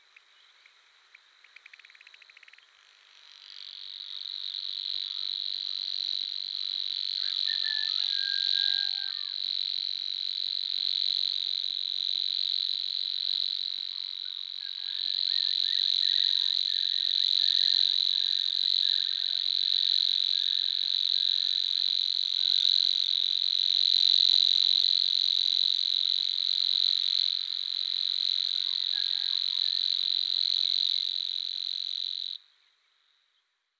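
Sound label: solovinyy sverchok voice, river warbler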